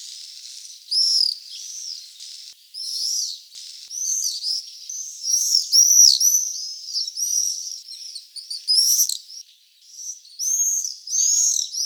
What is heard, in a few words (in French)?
martinet noir